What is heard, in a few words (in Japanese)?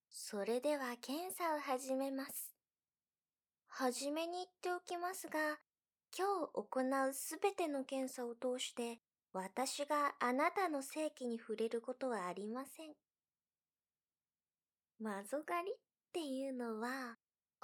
耳舐め編